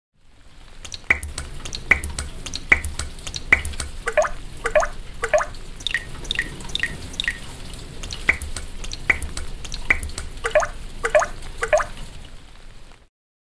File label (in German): Wassertropfen in Wasser tropfen